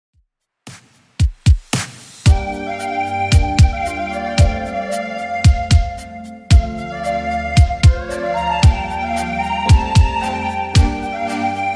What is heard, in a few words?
80s Redrum 113bpm